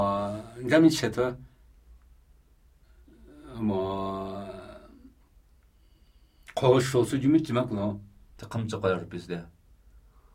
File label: Namuyi Tibetan Ritual Chanting